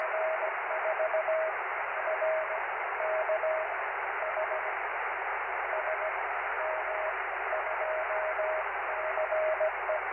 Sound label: cw